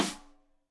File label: Rim Shot Stereo; Deeply Sampled Drums; SMDrum